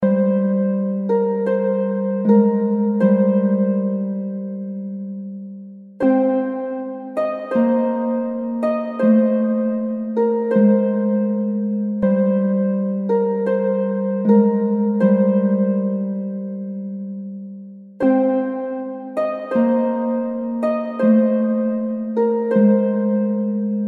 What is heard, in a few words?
MANDOLIN